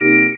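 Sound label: Talk Box organ "ee"; E-MU Producer Series Vol. 8 – Platinum Phatt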